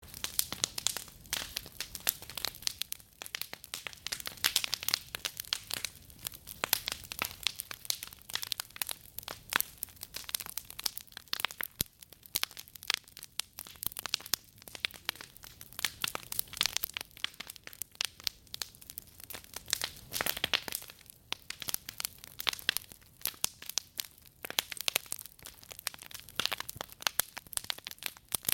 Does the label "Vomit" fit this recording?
No